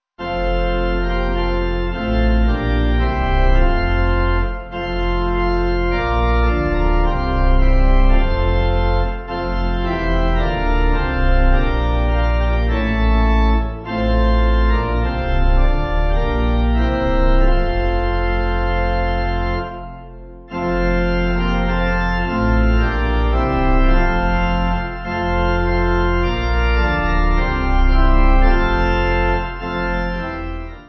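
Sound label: Pipe, Pipe Organ backing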